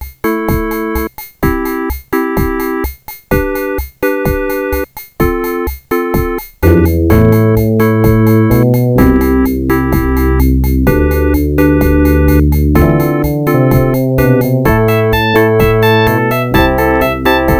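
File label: Orchid NuSound PnP 32, Sound Blaster, FM Emulation; 44 kHz stereo FLAC recording; raptor; Speaker out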